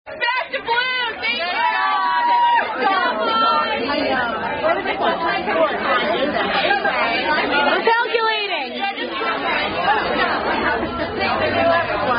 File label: Door Chant, Boom, Boom Fraternity Chant Song; Sigma Nu Door Chant